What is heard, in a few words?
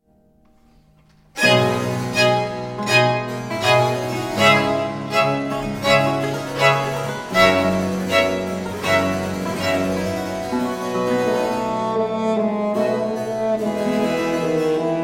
bassoon concerto in c major